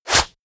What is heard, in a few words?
wipe